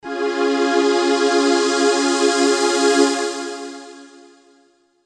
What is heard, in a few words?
K5k SQx2 Pad